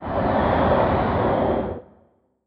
airy whoosh; SoundsCrate

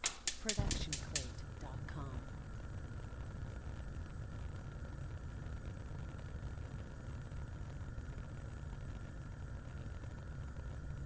stove top; soundscrate

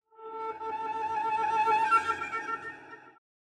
Cello Octave Flutters; SoundsCrate

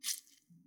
blood squish

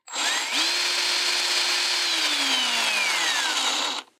drill revving

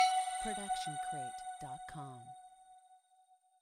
tonal blip; soundscrate

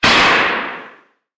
Gun Shot; SoundsCrate

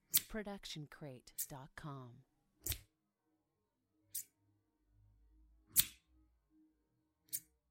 scissors; soundscrate

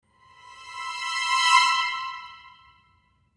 high dissonant cresc

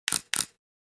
camera clicks

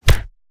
Fight Impact; SoundsCrate

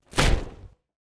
body impact wood

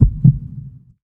heartbeat double

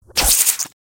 web shooter; soundscrate

